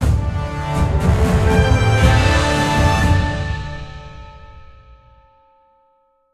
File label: Battle won; Worlds of Magic: Planar Conquest; PS4